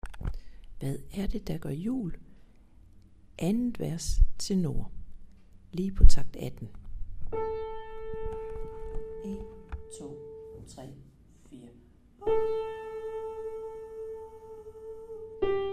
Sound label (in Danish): Tenor